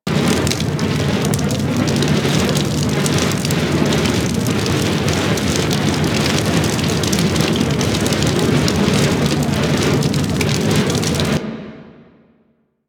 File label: Fire Loop; Warframe; Ambience; PVP; Lunaro; Tenno Ball Arena